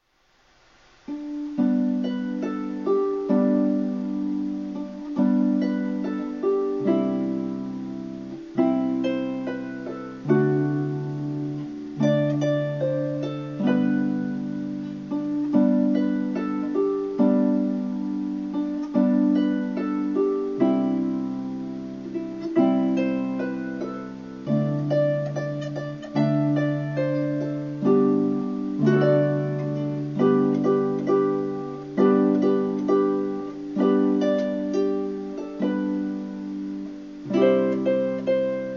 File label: Jingle Bells